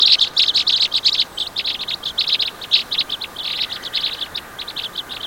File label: Red Crossbill Type N01 - FCs of two call matched paris